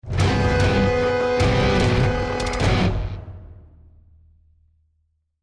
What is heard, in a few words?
deathcam